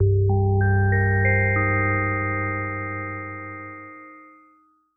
Synth

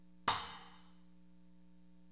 Crash, Cymbals; Drums & Percussion; One Shots Drums

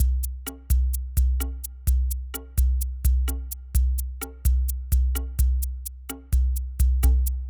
Electronica Drumloop

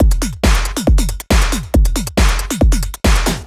Dance Drumloop

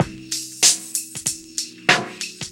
Breakbeat, Drums & Percussion

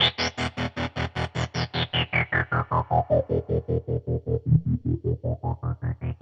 Distorted Guitar; Stringed Instruments

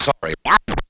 Scratching, Vinyl Scratches

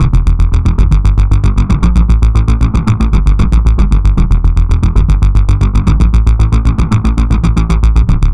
Synth Bass

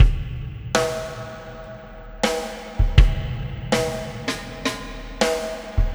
Acoustic Drumloop

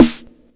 Snare; Drums & Percussion; One Shots Drums

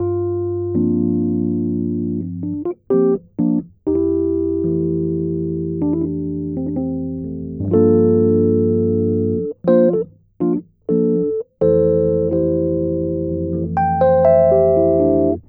E. Piano

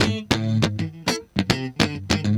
Funk Guitar; Stringed Instruments

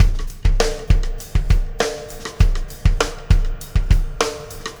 Acoustic Drumloop

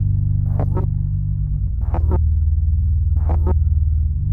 Synth Bass

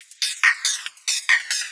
Dance Drumloop

